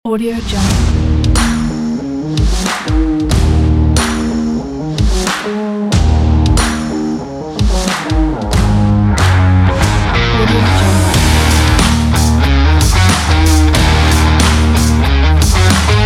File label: stylish rock action